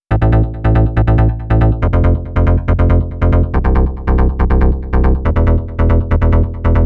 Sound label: 140 House Bass Amin; Dance DJ; Digidesign; Pro Tools; Pro Tools Essential Loops